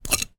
medical hospital thermometer slide out of plastic case; zapsplat